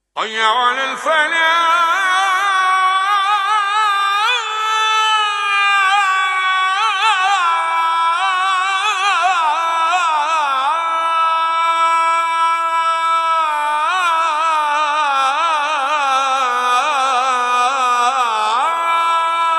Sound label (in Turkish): Ezan alarm